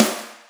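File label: Rock snare drum, Boss DR660 drum machine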